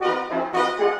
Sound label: Orchestral Loops; 128 VERTIGO